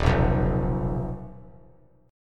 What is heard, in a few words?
D sharp Suspended 2nd Sharp 5th D sharp; strummed; JGuitar